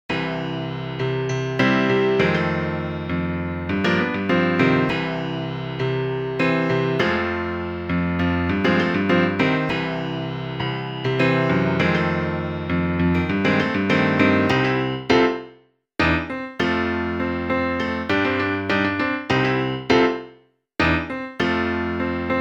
Klavirove blues, Hudba; Revelation Sight and Sound SK

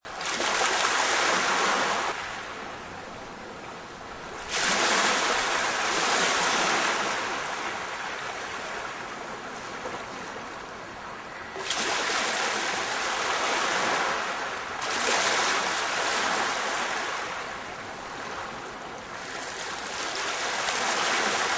Vlny; Revelation Sight and Sound SK

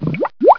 Odtekanie vody; Revelation Sight and Sound SK

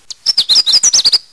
Vtacik; Revelation Sight and Sound SK

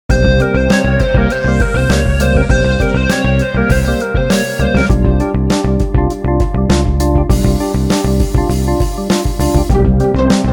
Hudba; Mestska horucava; Revelation Sight and Sound SK

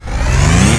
Zrychlenie; Vesmir; Revelation Sight and Sound SK

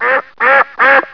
Kacica; Mesto a dvor; Revelation Sight and Sound SK

